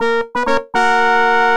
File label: Fanfare